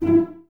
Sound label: CES E 4 Pizz, Cellos, String Ensembles; Miroslav Vitous